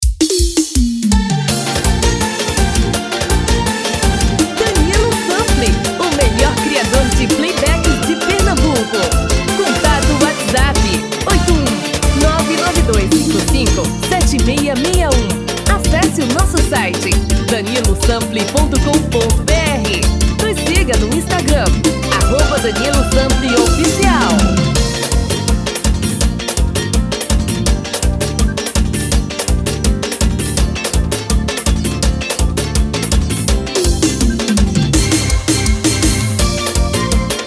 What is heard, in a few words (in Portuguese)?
tom masculino